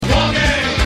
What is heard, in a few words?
walking